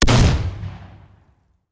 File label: Hand Gun; Sound Ideas; Guns